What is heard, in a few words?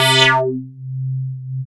syn, Techno; Techno ID